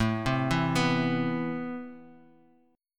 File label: C Major 7th, A, guitar chord; Cmaj7/A arpeggiated; JGuitar